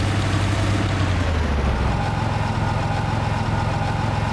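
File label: crossroads; krizovatka; Mafie 1 cz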